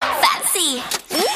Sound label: Fancy woo